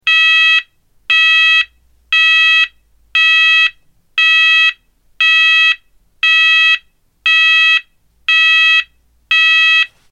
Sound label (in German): Ton